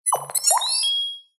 science fiction computer console gui robot complex beep